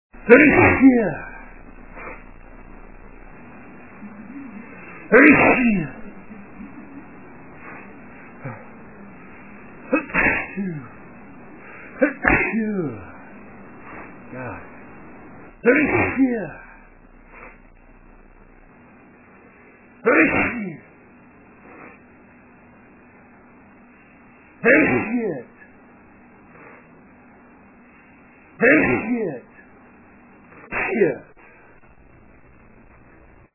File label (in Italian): Sternuto, male